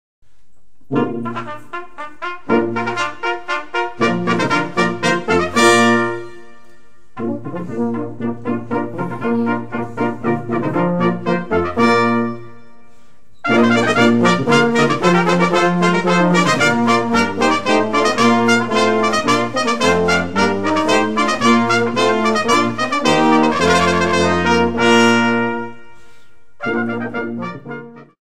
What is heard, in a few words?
Trumpet tune; goldenbrass